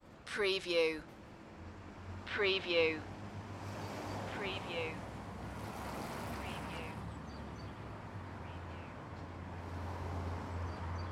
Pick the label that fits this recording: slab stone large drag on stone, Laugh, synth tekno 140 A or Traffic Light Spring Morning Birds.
Traffic Light Spring Morning Birds